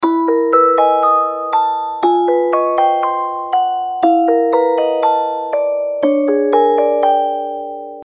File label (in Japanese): ミステリー オルゴール